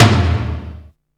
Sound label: Mpc 60 Tom, Percussion Hits